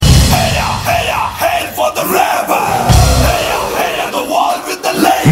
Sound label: Rebel Background Mus